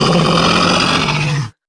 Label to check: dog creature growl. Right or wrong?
Right